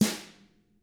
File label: DW BR, Drums; BRUSH